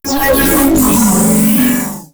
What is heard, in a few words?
Aggressive Pose; Warframe; Corpus; Jackal Redux